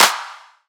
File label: Riddim Clap; One-Shots; Ghosthack; Riddim Essentials Volume 2